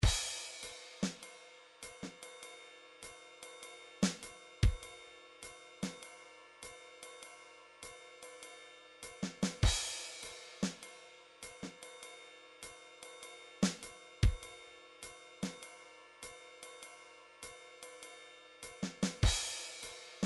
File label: drums swing, midi drum patterns